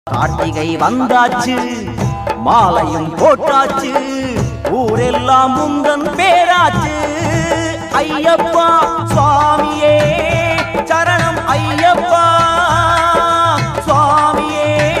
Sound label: songs in tamil ringtone; ayyappa; sabarimala